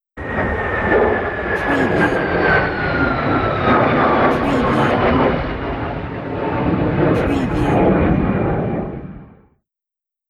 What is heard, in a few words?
airplane; PA System